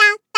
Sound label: Vo Main